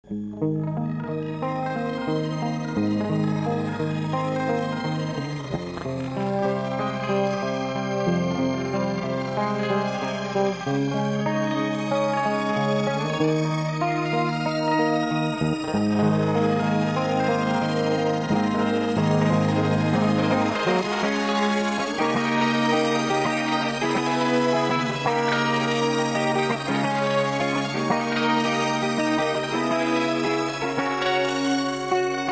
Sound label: shimmer, guitar